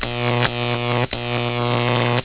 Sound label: electric current sounds arcs